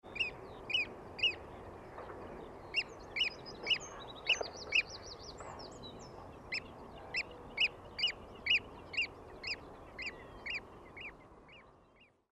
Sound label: oystercatcher